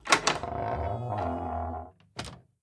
Door squeak creak; Devour

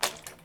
Wet Footstep; backrooms 2d android ios online windows gamerip

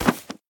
Pickup Book; The Town

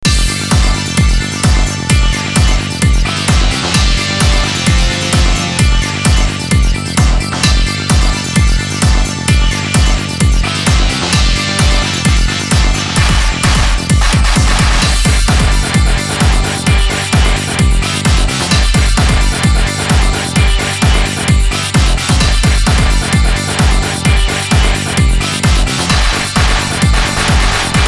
Shatter (Power Up)

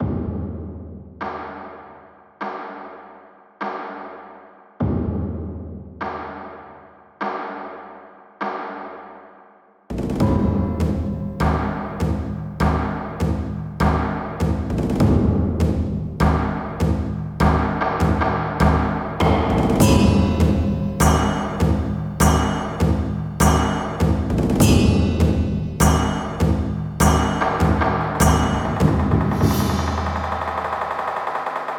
tension alt; Oriental Empires

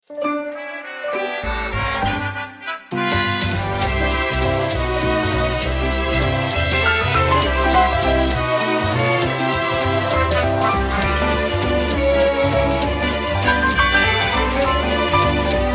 Underwater music; New Super Mario Forever 2012

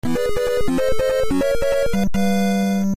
Low Time; Mari0

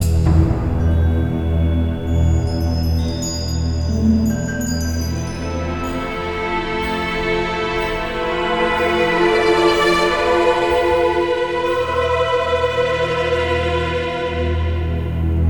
Caves Melody Loop

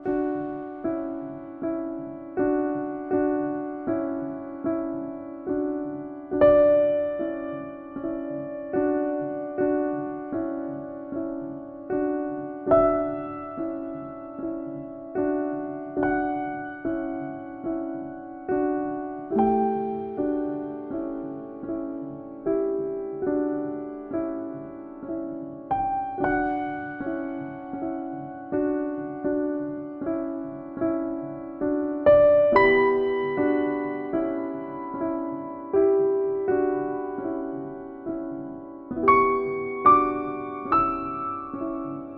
Hallway Piano

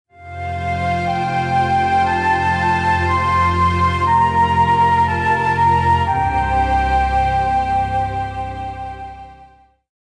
Industrial Calm; Civilization Revolution

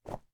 Snow

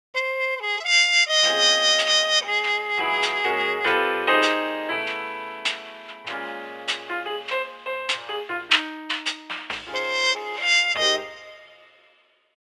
Radio Jingle (Evening); Animal Crossing New Horizons

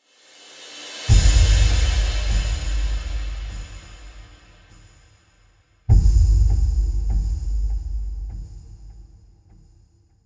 music temple area stinger; level 1-3

